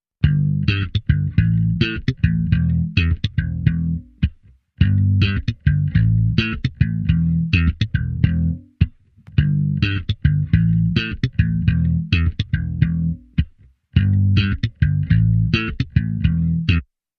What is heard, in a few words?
Almost Funky, Bass Only